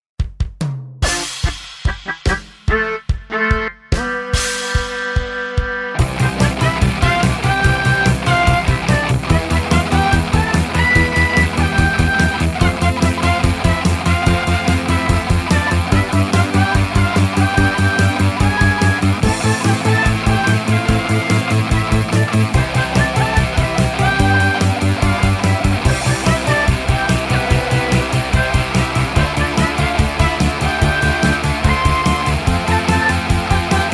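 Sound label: warp, BGM ロック; インストゥルメンタル; 激しい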